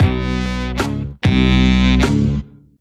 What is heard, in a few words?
Stabs